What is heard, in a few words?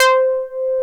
Synth Bass, Roland